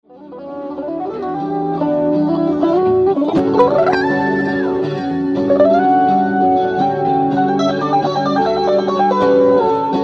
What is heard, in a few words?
2 chord